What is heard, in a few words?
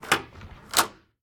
door open; Golden Crayon Sounds